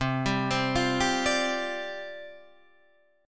C Suspended 2nd Suspended 4th, down, Guitar, Open D